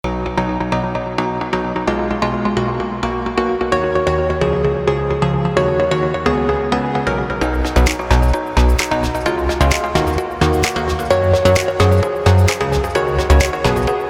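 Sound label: essential trance pluck; rdgaudio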